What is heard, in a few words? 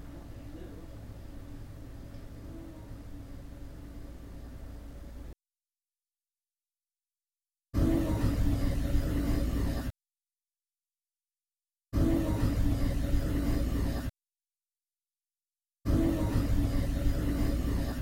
Child Hum; Norris House